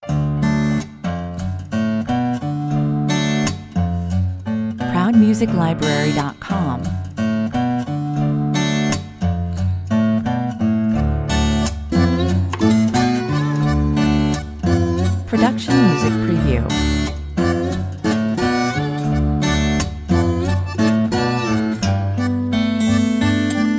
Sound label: bluesy no drums